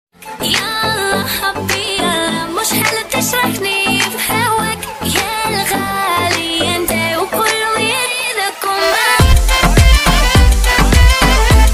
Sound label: balkan remix ringtone bass boosted